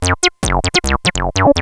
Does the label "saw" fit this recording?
Yes